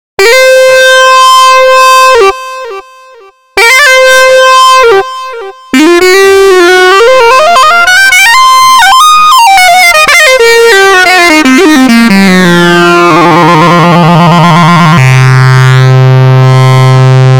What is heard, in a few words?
minimoog; jordan rudess